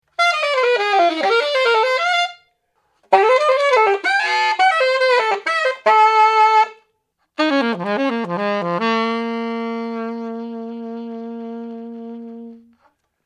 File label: SAX WORKS